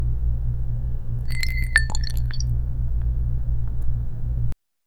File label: a soft liquid soft sound